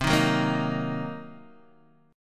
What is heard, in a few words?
C Minor 7th Flat 5th Guitar Open D, chord